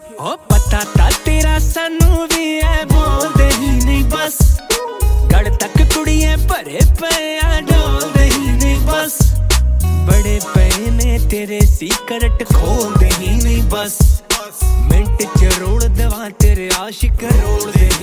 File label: Punjabi Ringtone